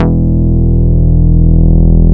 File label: BS MINIMO, Synth Bass, Analog Bass; Roland L-CD701; Synth Bass 2